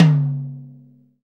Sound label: TOM TOM, Real